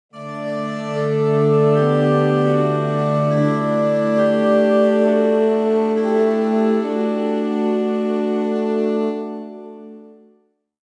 Ancient Calm; Civilization Revolution

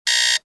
Alarm Clock End; bullet party

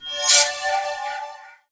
Heal; Puzzle Chronicles; PS3; PSP; X360; SFX SPELLS

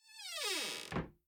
Hiding Spot Enter; The Town

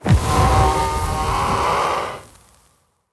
Ghost Death; The Town

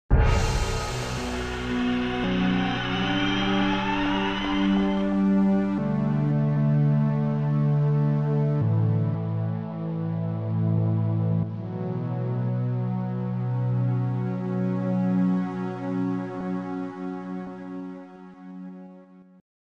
Streets Death; NYC

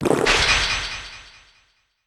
Potion drink

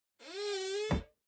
Hiding Spot Enter; Devour; The Town

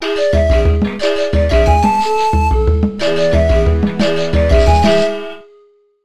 Level Complete Win; Where the Wild Things Are; NDS

